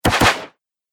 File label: Archery Two Fast Shots